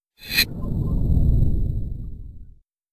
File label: Windows Beta Shutdown; Windows Never Released Soundpack 1